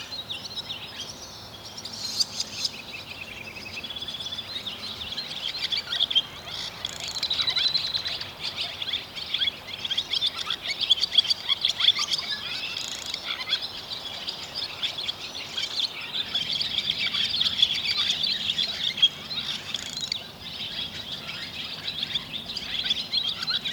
Binaural Beats Brain Waves Isochronic Tones Water Sounds; Brainwave Entrainment; for Sleep